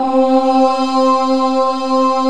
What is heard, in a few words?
OOH VOX S